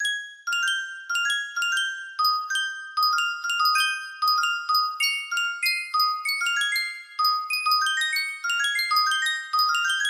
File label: 12 note music box; sankyo